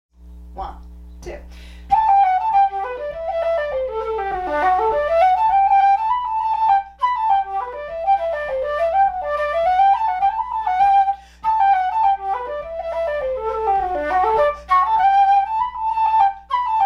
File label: SOLO flute